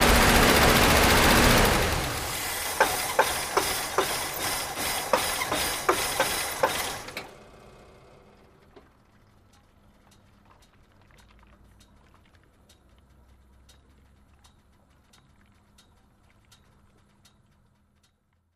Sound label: Car Diesel Off